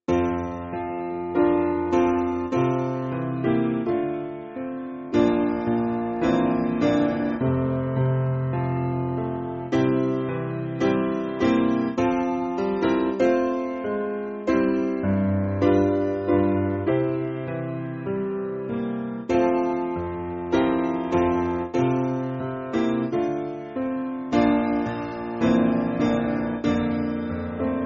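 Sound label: Piano, Music Backing Files for Churches; Purer In My Heart; Fillmore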